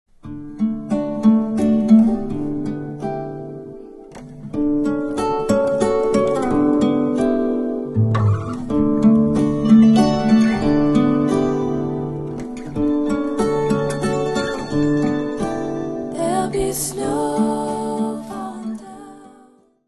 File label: Lullaby For Christmas Eve